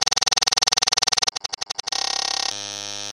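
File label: clicks monotonous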